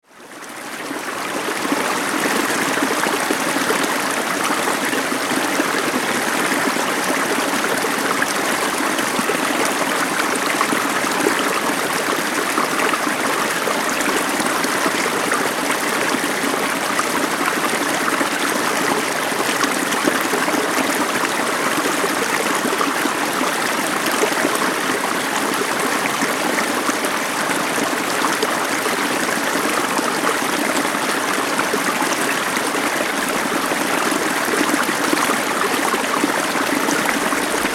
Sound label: falls stream; fairy